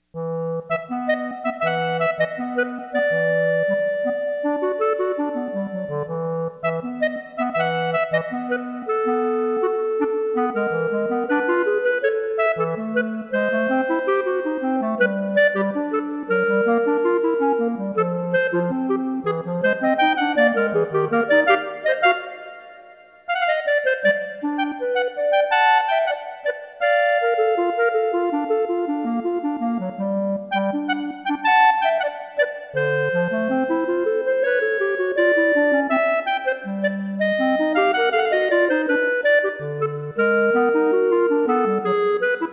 BOSSA; Scored for two Bb clarinets.; Duet

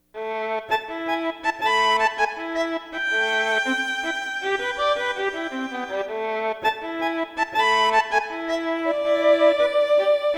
BOSSA; Duet

